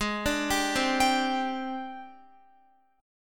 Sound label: Ab Major 7th Flat 5th Ab, down, Guitar; arpeggiated; JGuitar